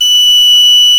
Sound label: PENNY WHIST; E-MU Producer Series Vol. 2 – More Studio Essentials; Composer; Whistle Combo